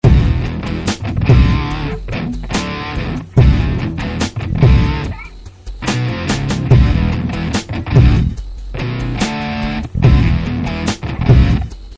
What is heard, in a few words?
Loop